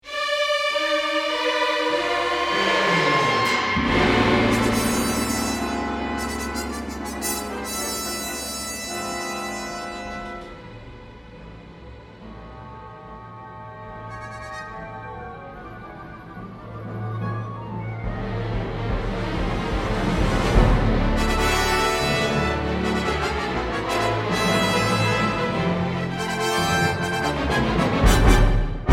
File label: Fanfare Recording